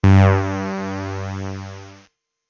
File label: Airplane